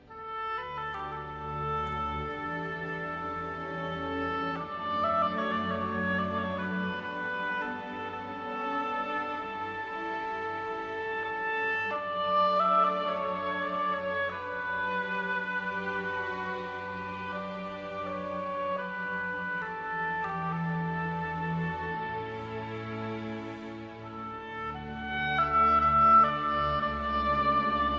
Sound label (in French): Hautbois